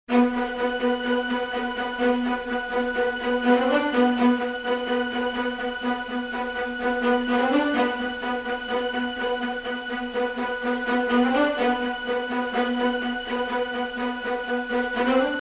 ostinato strings, Ostinato strings (violas and second violins, together with subtle harmonics in first violins); epic orchestral track